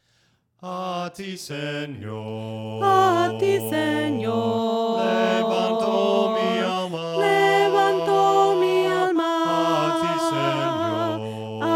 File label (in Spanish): VOCES, himnos a capella; cantos del camino; A TI SENOR